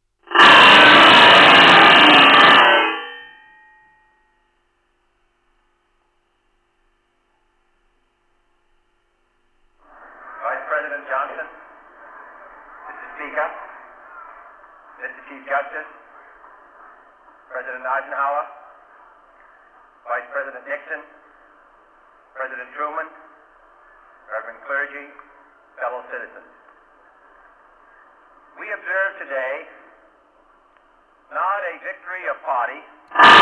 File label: howling